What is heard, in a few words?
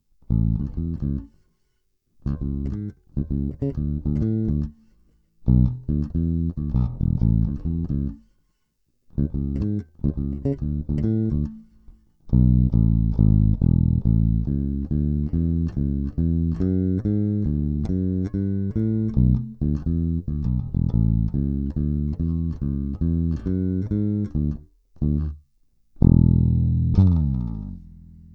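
750C - Copper White Nylon, bass; La bella; Rotosound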